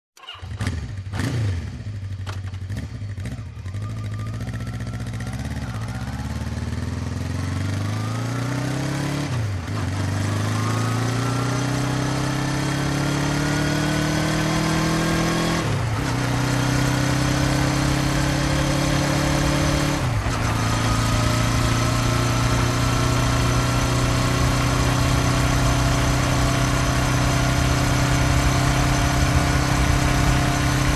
Bike ride

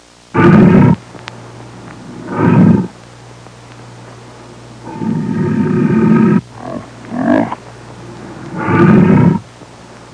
Jungle leopard snarl

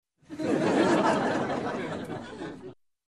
Crowd Laughs

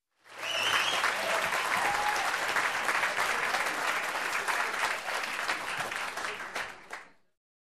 Audience in a show whistle and applause

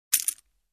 Poker Chips Bet

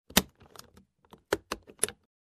Opening suitcase

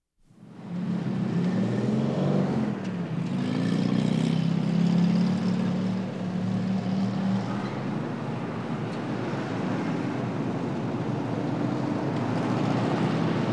City Traffic With Whistle

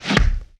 Heavy Hit Swipe punch

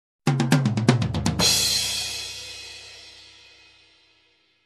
Tom drum fill

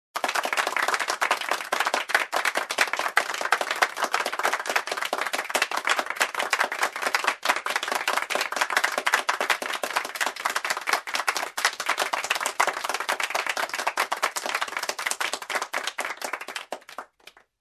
People in a close room applause

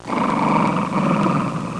Dog snarl

